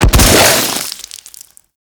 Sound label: Modular Secondary Barrel Explosion; SU Modular Guns; Barrels